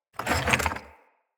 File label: Clay Shuffle Texture; Ambience; Soul Plane; Vendor